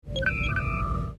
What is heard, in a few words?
hailing frequencies open, computer